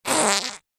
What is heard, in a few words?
human fart wet; zapsplat